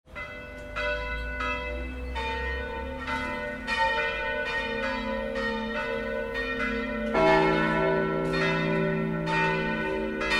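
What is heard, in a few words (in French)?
sonnerie, cloches; LAUSANNE; ST-FRANçOIS; avant-correction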